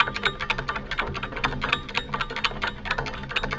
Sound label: winch loop